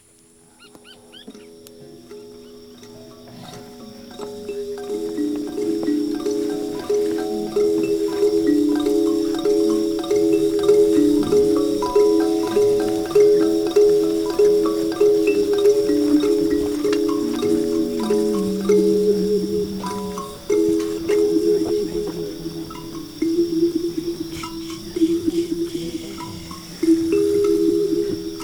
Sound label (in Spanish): Kalimba de Suenos; mexico 2005